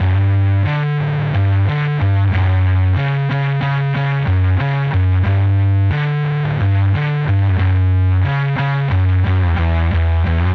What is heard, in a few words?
Electric Bass; Basses